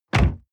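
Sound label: sports car exterior door close medium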